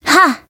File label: Voice attack; nagi